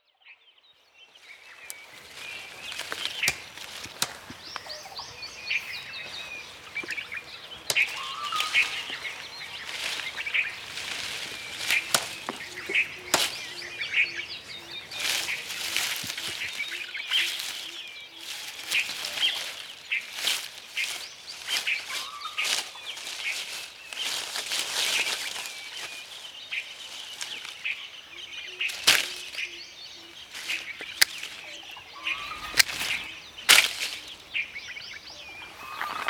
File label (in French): Le botaniste détache une entada